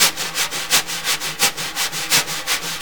Synclavier Percussion, World & Orchestral

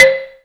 ZYLIMBA; Greytsounds VOL-1

